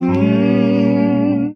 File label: barbershop quartet haromizes, Voice